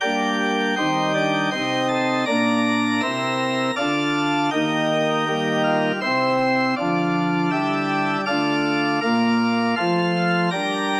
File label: birth of the saviour, organ